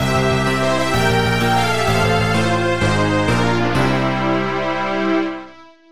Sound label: game over theme; knightmare